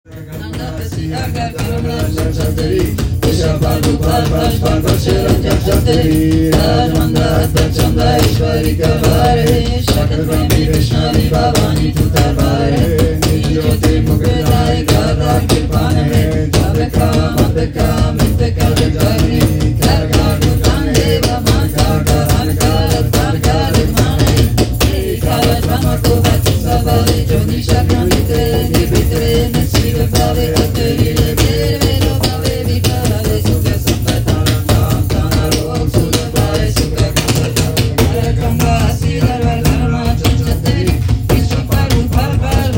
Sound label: drums